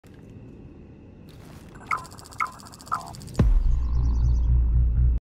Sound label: lion scan sound